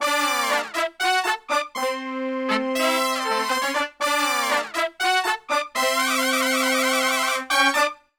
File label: POP D, Brass; Best Service; Brass Super Section; POP LICK